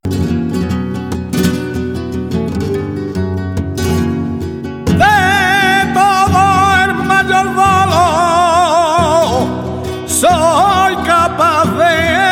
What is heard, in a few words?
Fandango; de Almonaster